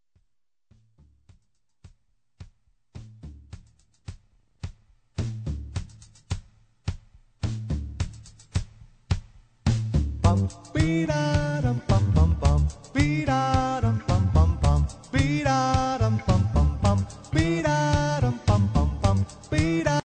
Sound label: POLKA MUSIC FAN